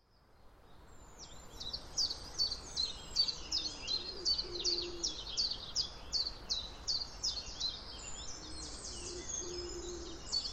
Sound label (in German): Geräusch Vogelstimmen 6 Uhr